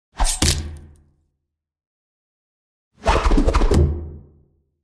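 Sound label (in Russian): нож кинжал втыкается с размаха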